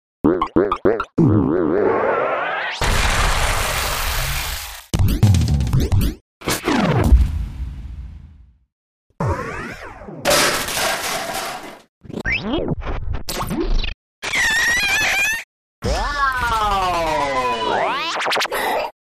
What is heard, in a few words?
Toontime